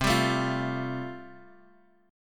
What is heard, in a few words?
A Minor 7th Sharp 5th C